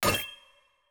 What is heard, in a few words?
Decompose